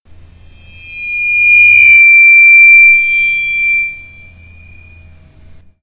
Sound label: feedback; Left 4 Dead 2; Source Engine